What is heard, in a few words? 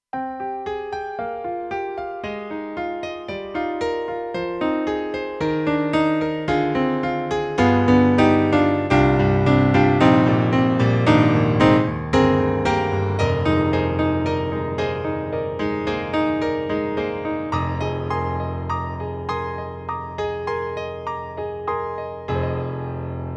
Piano; Roland; SC-8850; Piano 1